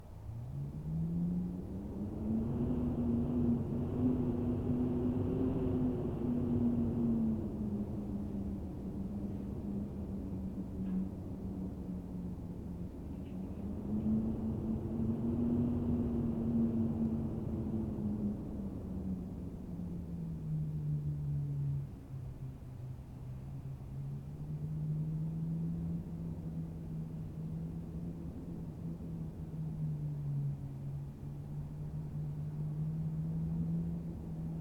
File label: Howling Wind Inside